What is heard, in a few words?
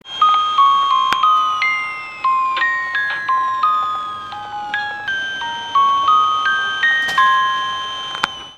chime; nishinihon; kiha181